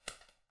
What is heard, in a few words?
squish snap; angband